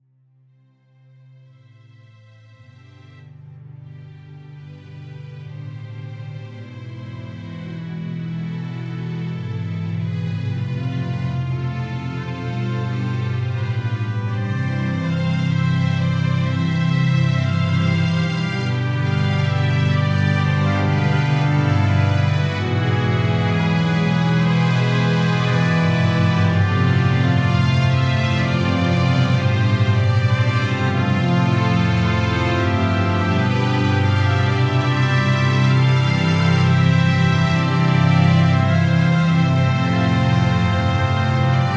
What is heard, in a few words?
Orchestral